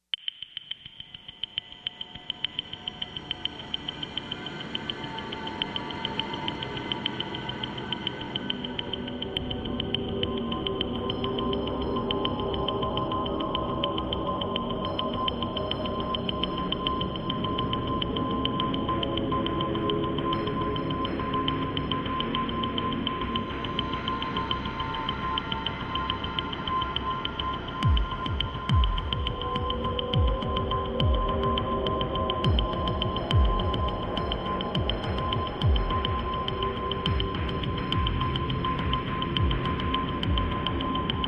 Documentary Soundscapes Light Serious Time Bomb with texture